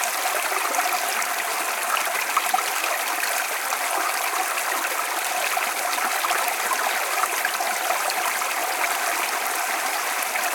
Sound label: stream babbling